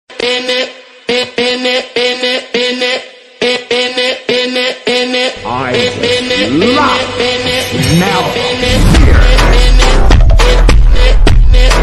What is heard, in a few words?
Attitude BGM, English ringtone